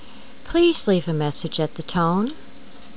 tone, phone messages

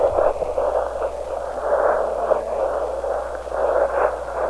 chant

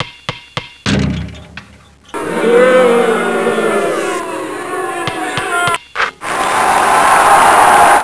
b-ball music

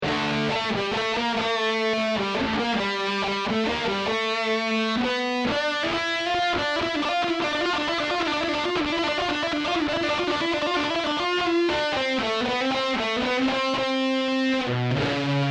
solo test

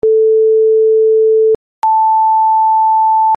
sine plus sqr

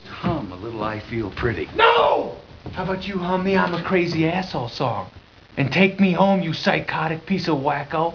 anger hum

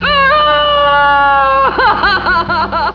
cryin; elephants

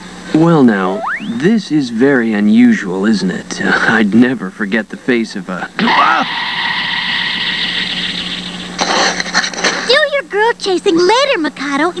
girl chase

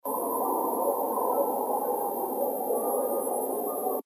owl

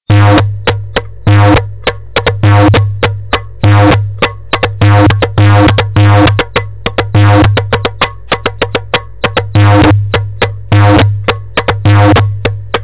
Freaky Maksoum Masmoudi

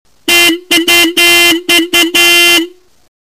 Beeps; Herbie

